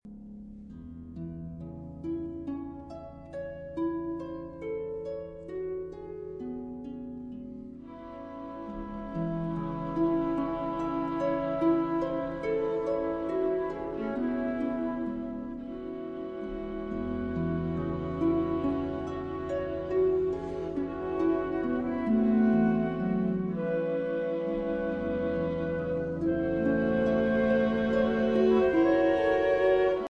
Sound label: Trio; Genzmer